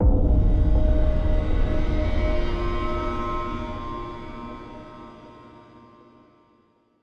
Stingers sting pain

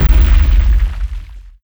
Column Break

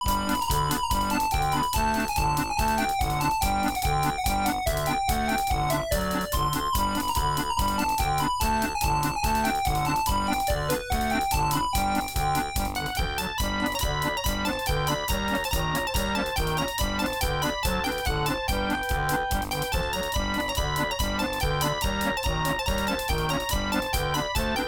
Bells; Cosmo's Cosmic Adventure; reworked midi soundtrack

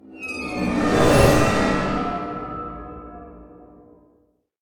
Stingers ui endless trial failure

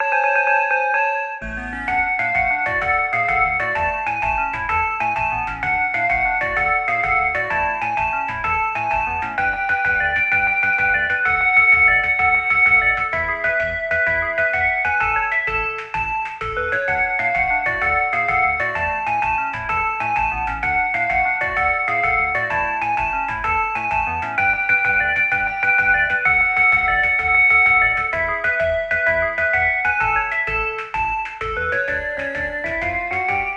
Bgm Prize; Fist of the North Star Lost Paradise; Hokuto ga Gotoku; PlayStation 4 gamerip

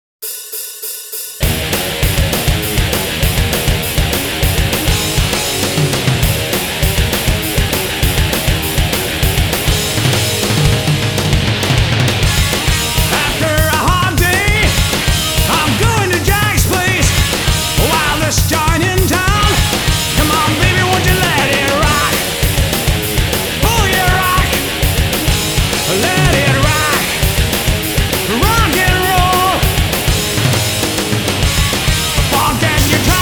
Bass Minus Track

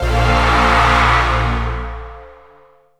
Stinger; Blak Threader; Black Future '88

